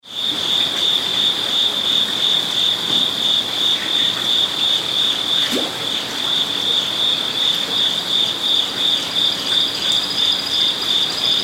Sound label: crickets koi pond Kyoto zen garden night Japan; Zen garden, Kyoto, Japan. Koi pond, crickets ambience, night time; Cricket Sound Effects